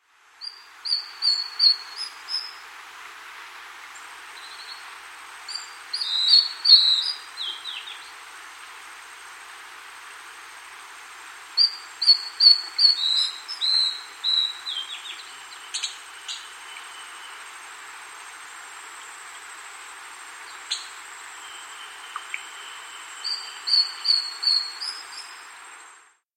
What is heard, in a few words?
Esmereldas Antbird, Nature's Songs, S. America